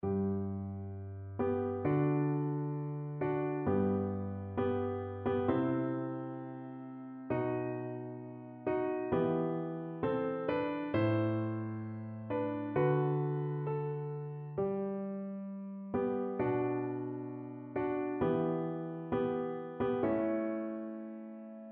pearl, piano

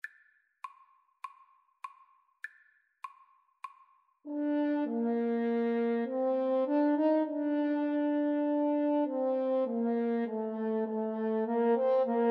French Horn Duet; bach aria

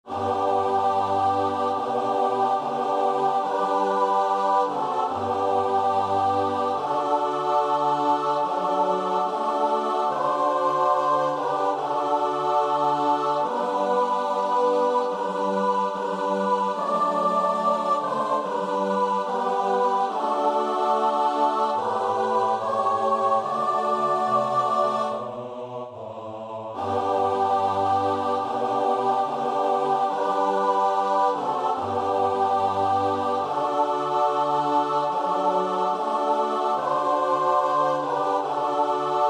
choir; latvia

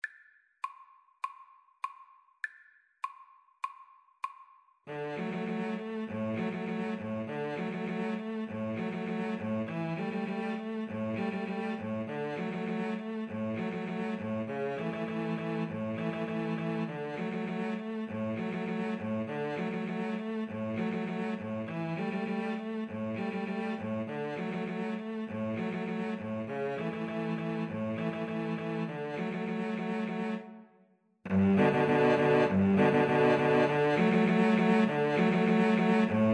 violin cello, Violin-Cello Duet; neopolitan

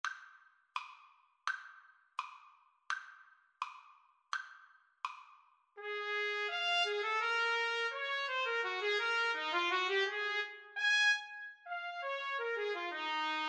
trumpet duet

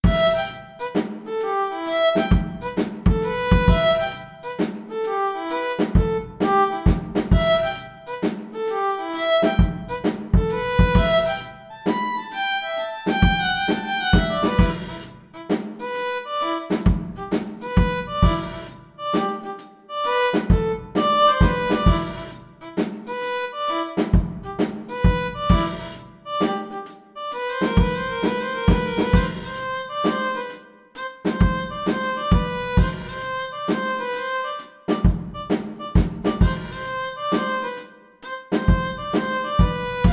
Funk Study, violin

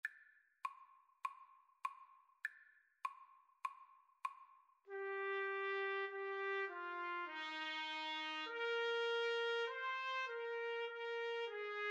trumpet duet

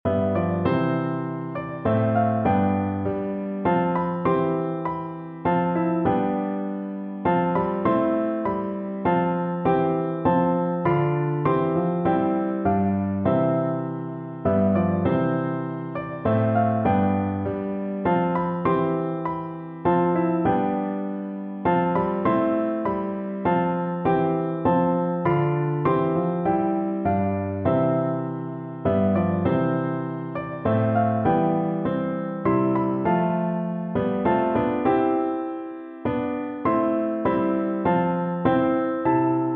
first noel, piano duet

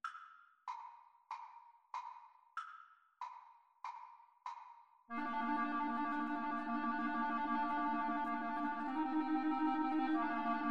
Flute Clarinet Duet

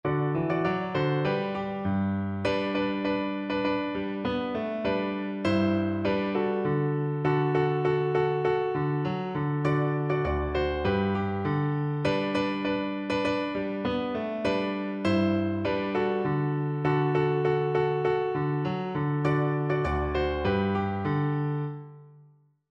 Oboe

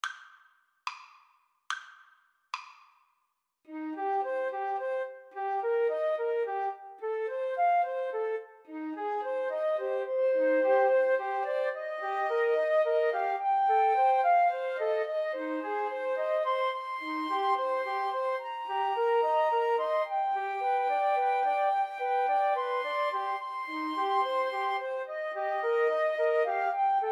house of the rising sun flute trio

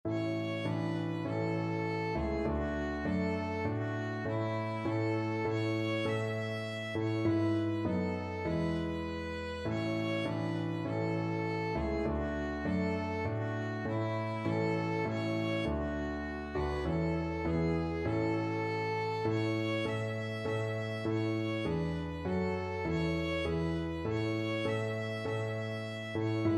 tis so sweet violin

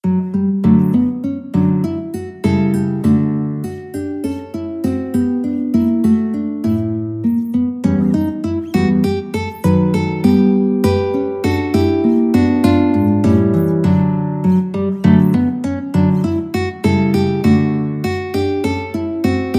Contradanzas, guitar

